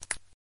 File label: skel, Skeletons; Blud Mage; StudioFreresChasseurs